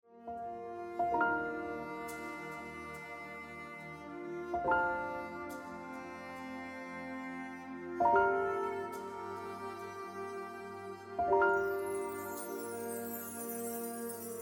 Emotional Life Ringtone Piano Music

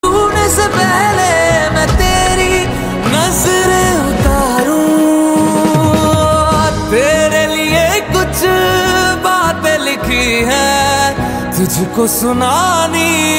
Ringtone

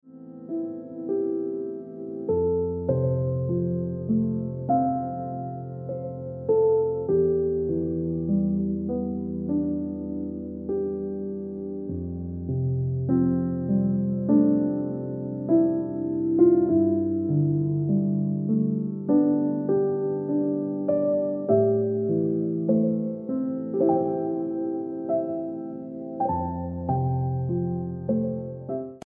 Instant Ringtone Piano Music

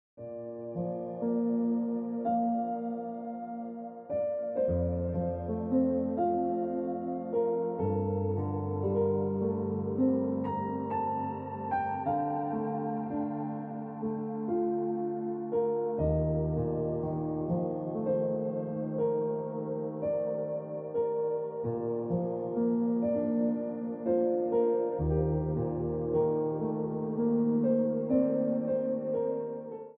Ringtone

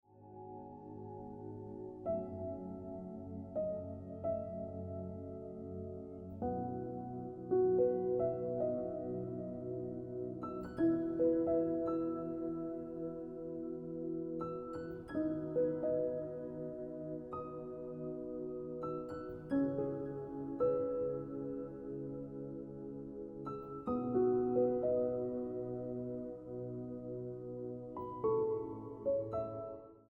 Looking Back Ringtone Piano Music

